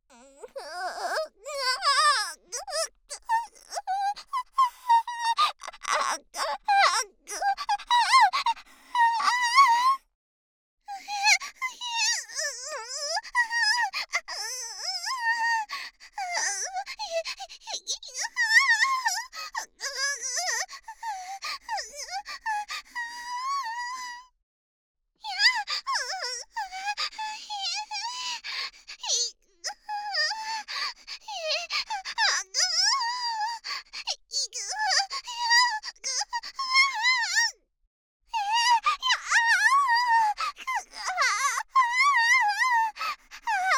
SEX・激しい喘ぎ声と悦びの声; ストレートお姉さん系カノジョ

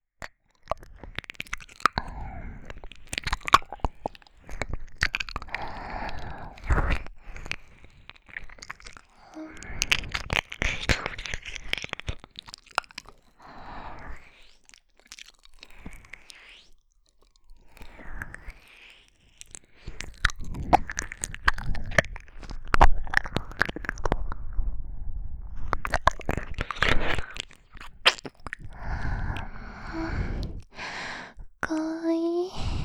耳舐め(右耳); 私だけを見ててほしいの。

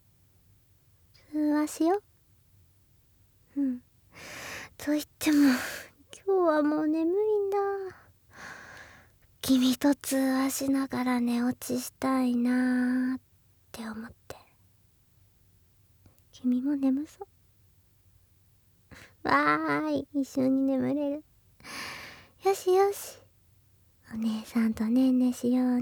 添い寝; クラスメイトと添い寝落ち通話

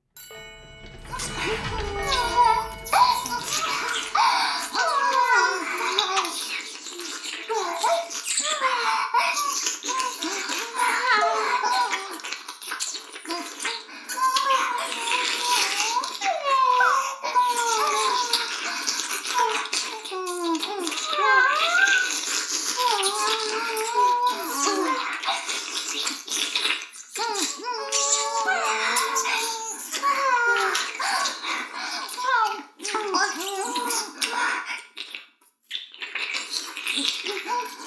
停止解除耳舐め音声; 逆時間停止されてストーカー後輩に

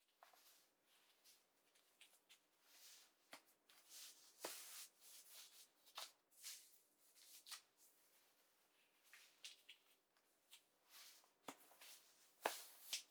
靴下を履く

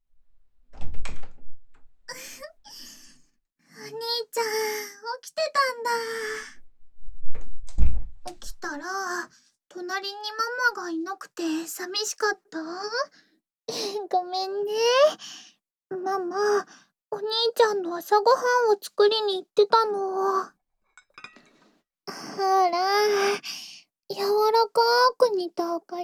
ぐちょぐちょフェラ; 妹ママにぜんぶお任せ・ゼリーのお口で

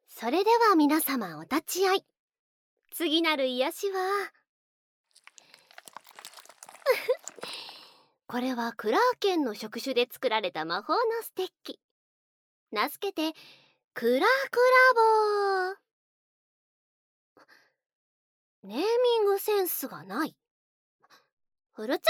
触手と口でねっとり耳舐め

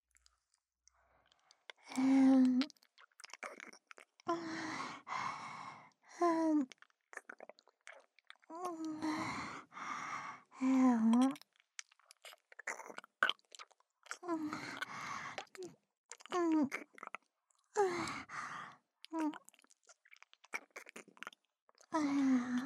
耳舐め long, ASMR

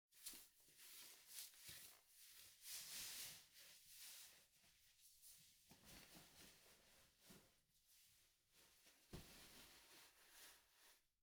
フレアスカートを脱ぐ; 3DIO; 色々な動作

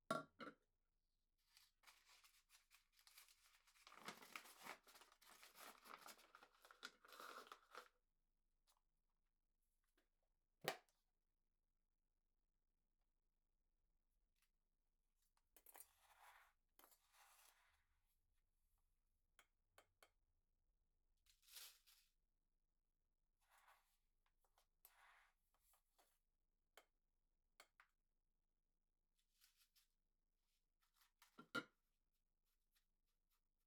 コーヒー 淹れる; KU100

